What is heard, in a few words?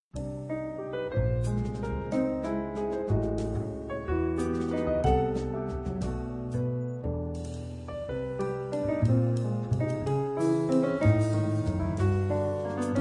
Music Box